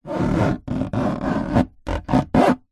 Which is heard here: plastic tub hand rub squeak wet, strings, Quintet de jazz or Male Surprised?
plastic tub hand rub squeak wet